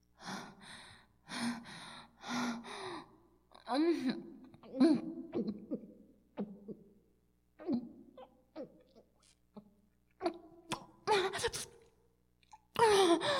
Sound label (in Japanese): イラマチオ